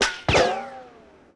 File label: Rico & Whiz By Multiple; Sony Pictures Sound Effects Series; Ricochets - Hits - Whiz Bys